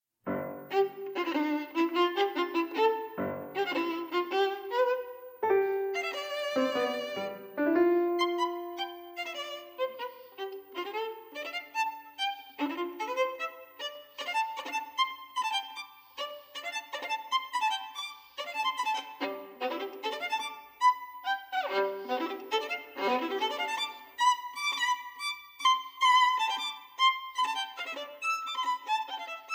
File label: Violin and Piano Allegretto giusto; Sonata